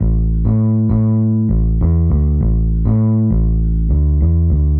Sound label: X Bass, Rock Bass